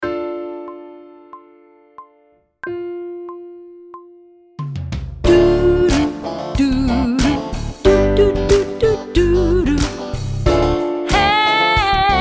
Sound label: Sight Singing with vocal, pop vocals; Grade 5